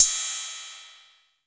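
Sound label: cymbal TR606, drum machines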